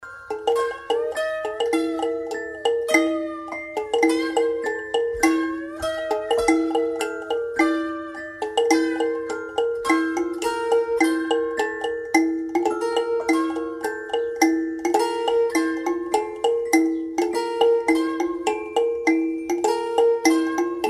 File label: GAMELAN; OBSOLETE